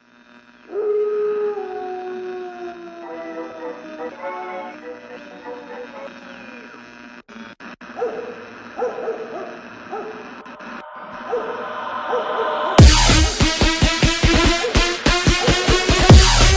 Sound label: Dubstep Remix; CARTOON DOG